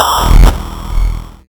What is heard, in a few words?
Load Drum